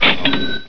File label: KaChing; Assassin